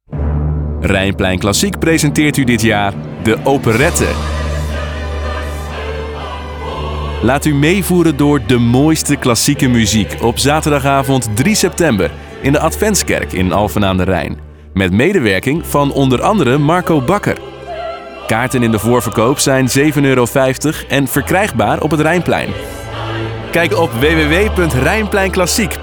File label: Operette